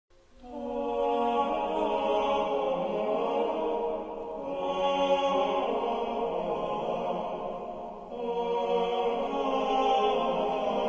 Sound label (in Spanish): clausula a dos voces; Anónimo